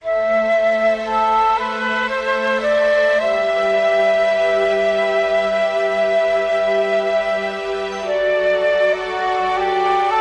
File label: Concluding Credits Woodwinds Strings & Percussion